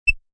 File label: Multimedia, Beep, Button, Menu, Rollover, Web Page Or Flash Animation Sound